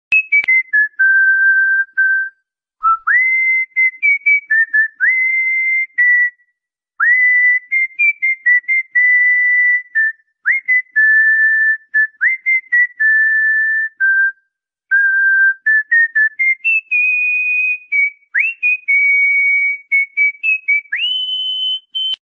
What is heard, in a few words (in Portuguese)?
passarinho hino; spfc